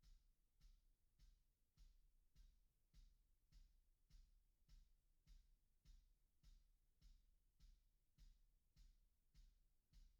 kick

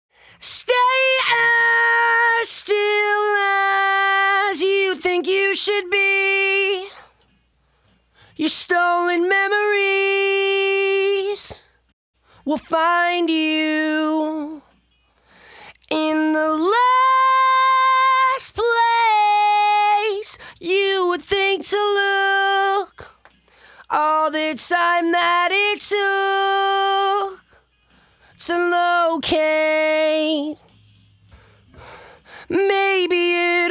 lead voc

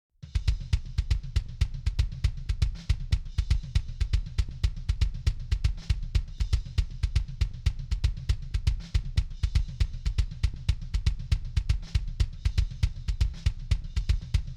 kick

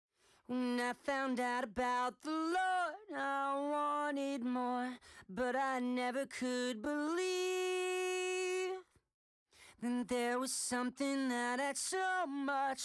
main vox

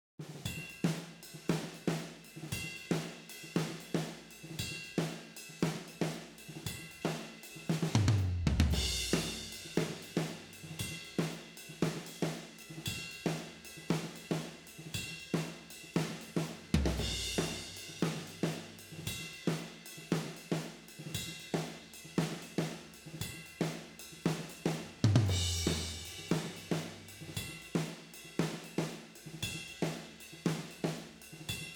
toms, ovrhds, room

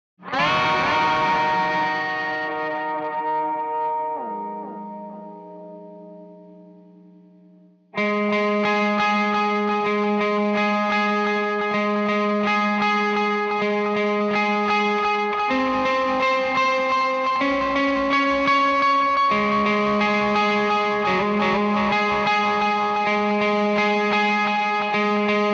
c gtr

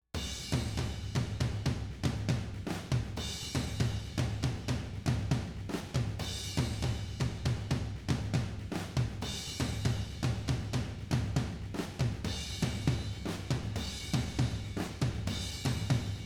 toms, ovrhds, room

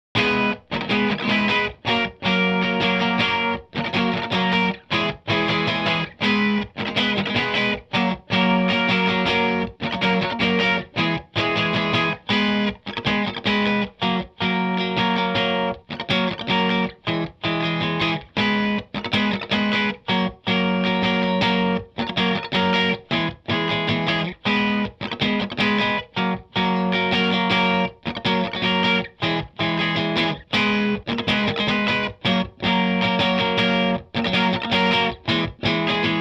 c gtr

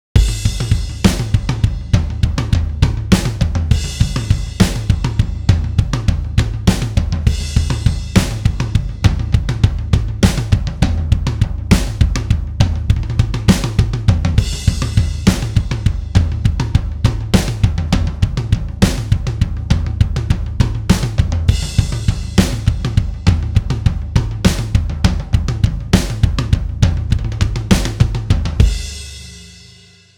drums

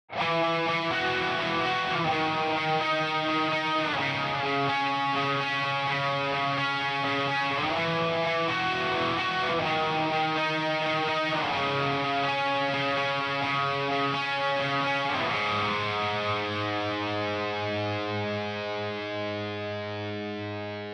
b gtr

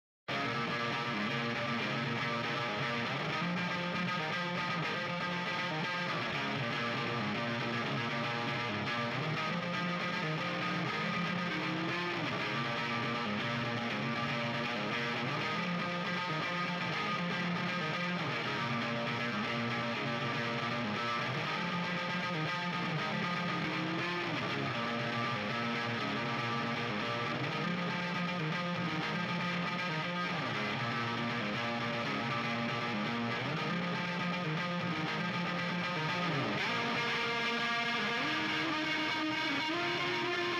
gtrs

